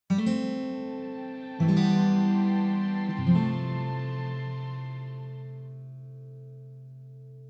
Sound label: Falling Asleep